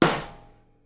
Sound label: SNARE, Live Snares